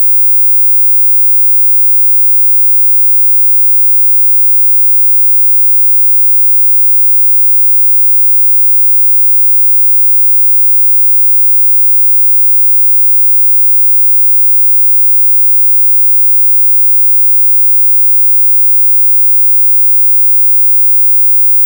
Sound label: Difference Tone; Rohde & Schwarz; UPA; Rohde & Schwarz UPA-CD